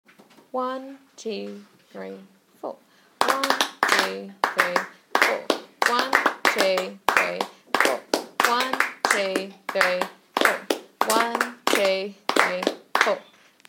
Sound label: rhythm polished